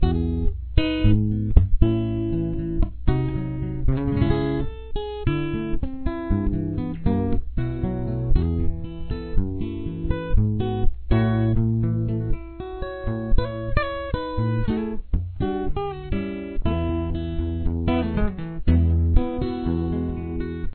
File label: verse b